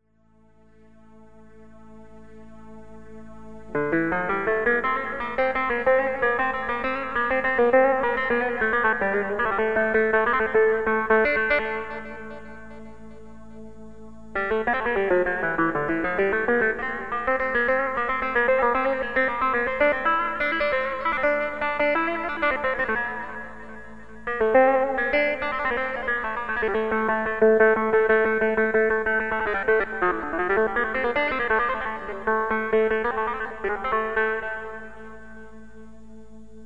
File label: Electro Baglama